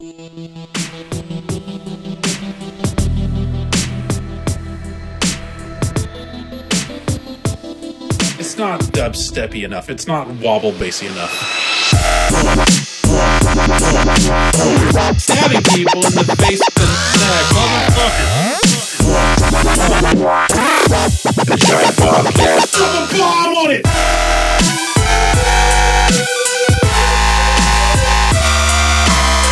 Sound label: dubstep; giantbomb; bombcast